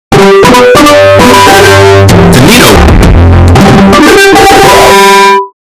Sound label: earrape